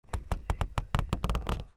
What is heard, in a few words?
HOUSE